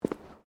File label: Footsteps womens low boots concrete step; Footstep Foley; Human